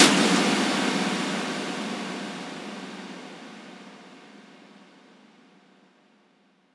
Hits & Explode

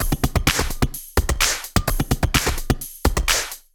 House loops 128BPM; Vengeance Samples

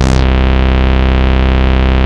Ultimate Bass Root C; Vengeance Samples

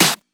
Snare; Vengeance Samples

